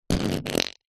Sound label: cartoon fart